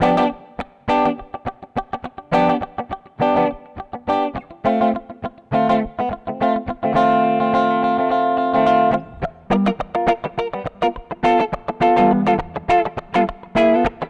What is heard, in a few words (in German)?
Kompressor Vergleich Original Gitarre